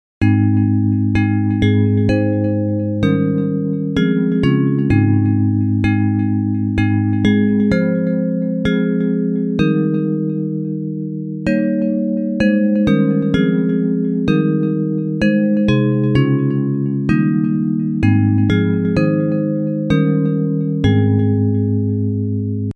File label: Blest Be the Dear Uniting Love Bells; Hymns; Praise